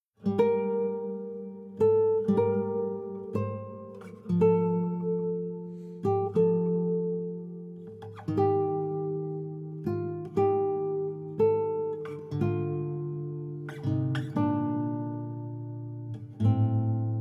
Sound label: Savannah Music